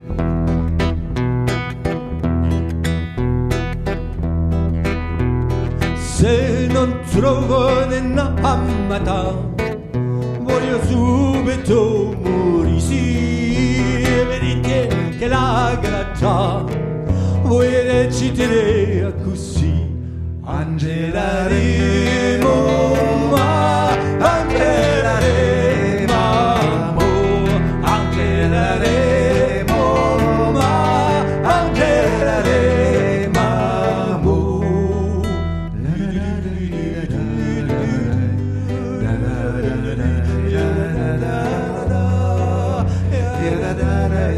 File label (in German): Valse